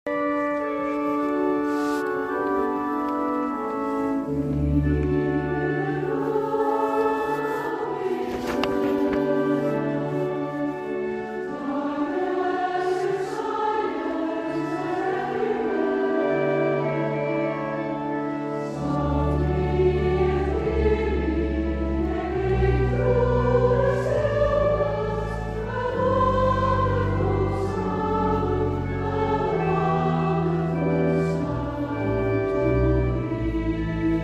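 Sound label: All bells in Paradise koor, gemengd koor; Paul Snoek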